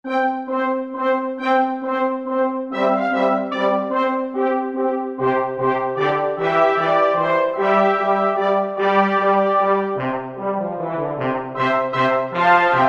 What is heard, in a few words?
Tudor Fanfare